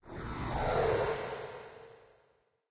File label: entrance, undeadtower